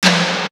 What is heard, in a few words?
Tom, Drum One Shots